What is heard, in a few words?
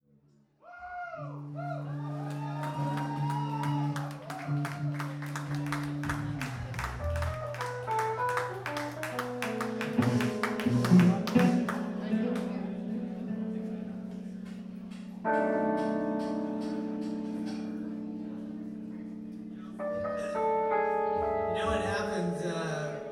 Tuning